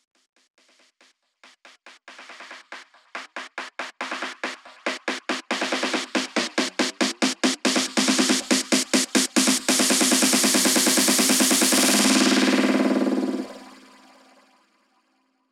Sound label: 140BPM SNARE 8 Bars; AlienStar; Vengeance Samples; Snares&FX Pairs